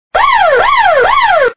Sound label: alarm loop